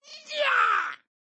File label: Submitting Answer; spongebob; nickmaster